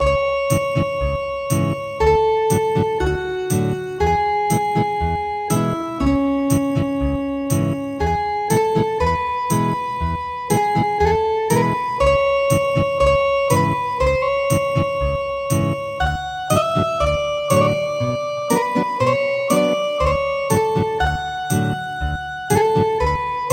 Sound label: Ethnic Guitar